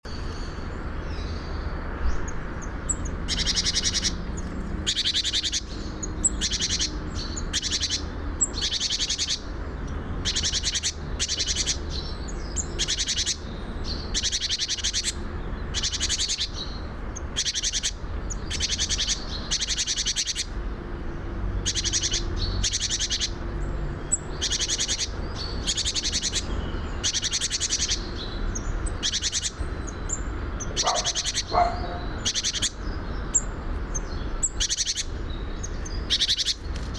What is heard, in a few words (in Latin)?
Parus major; SETE